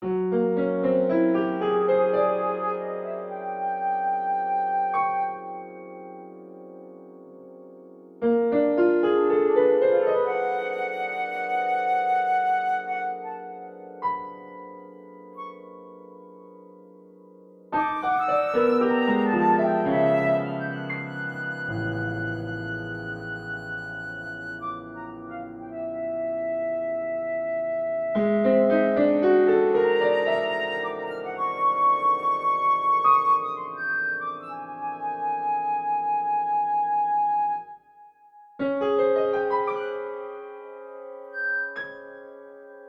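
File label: flute piano post tonal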